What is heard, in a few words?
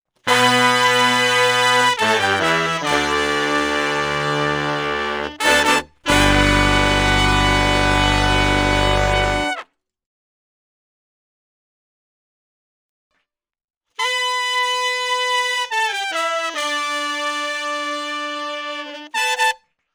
Section and Sax Trpt